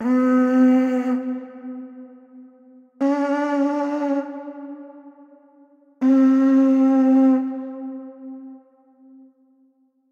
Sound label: ancient shell horn sounds